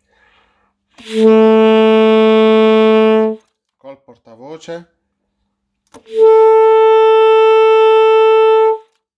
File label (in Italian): Fa diesis o sol bemolle, sassofono